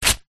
paper rip small peices single; foley

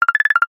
notification alert reminder clicks short; zapsplat

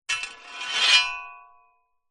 sword slow scrape on metal or other sword

